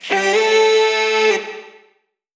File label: Omnivox oneshot Am, Vocal; One Shots; Slate Digital Omnivox Vocal Sample Pack